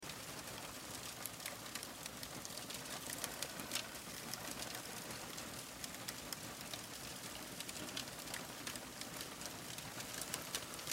Fire Paper, Fire Paper Newspaper Flame Flicker Loop; Flames; Objects; Flame Sound Effects